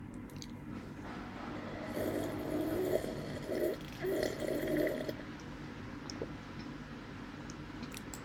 Slurping

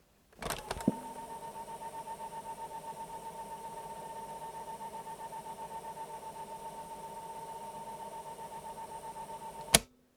Cassette rewind

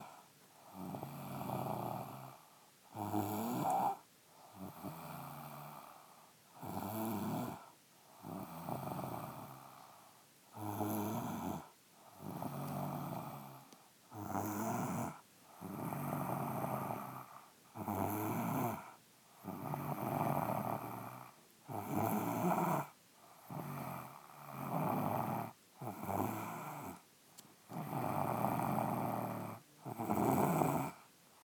Female Snoring

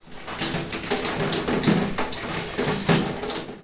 Big Drops

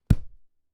Book Impact

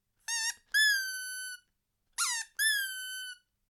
Clown Nose

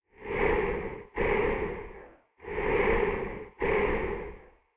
Breath

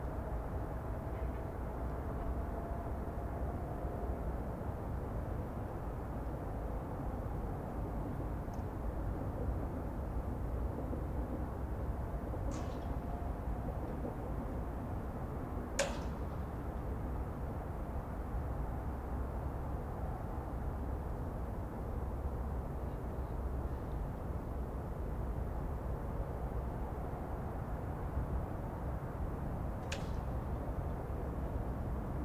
Far Train City Night

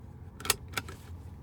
Car Seatbelt Click

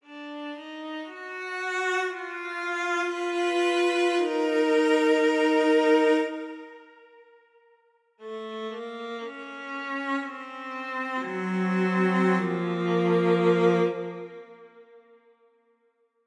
Short Outro

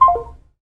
Mobile Phone Hanging Up

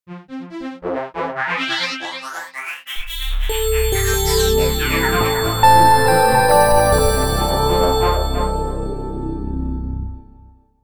Cold Elegance Logo

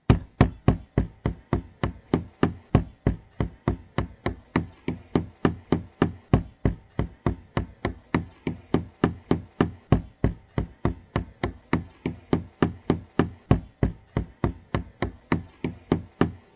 Running

